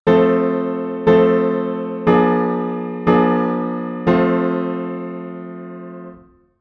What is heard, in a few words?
plagal cadence IV 16; 16 edo theory; 16-ET